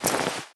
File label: foley step